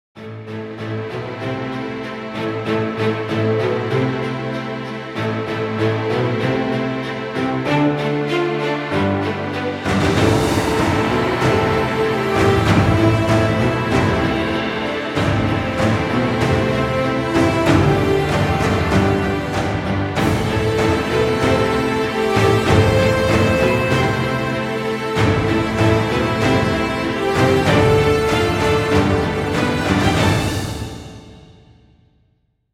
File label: Trailer Heroic, Epic Music